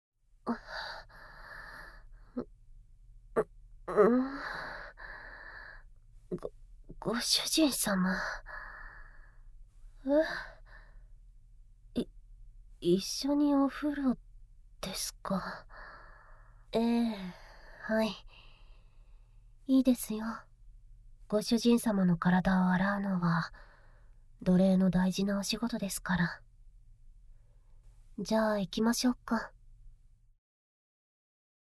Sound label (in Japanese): お風呂で密着ディープキス♪潮吹きながらオホ声絶頂, ASMR; SEなし